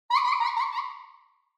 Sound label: Horror Laugh Reverb